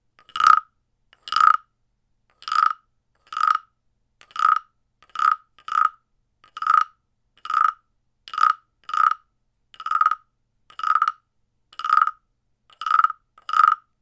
frosch mittel

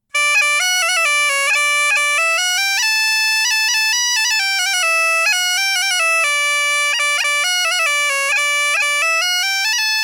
punteiro d melodie

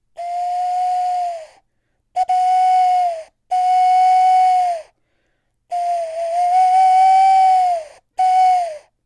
eule mittel

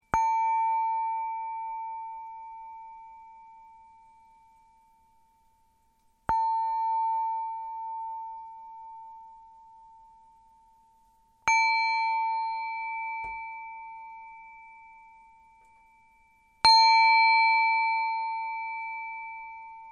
tibetisch 250g

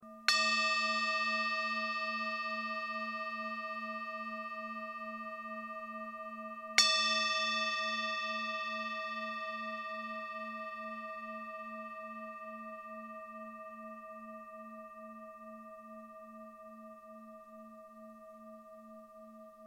klangschale

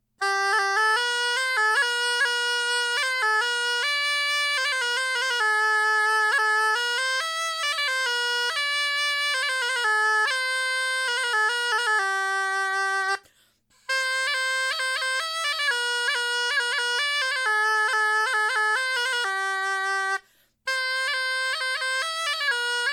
punteiro g melodie

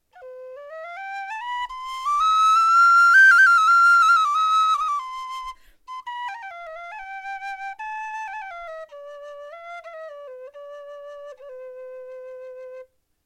schilfblock c

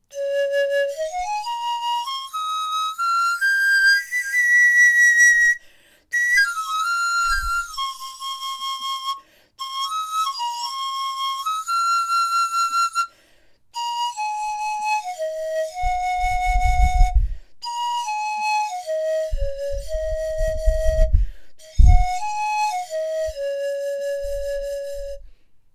indio panfloeten mittel

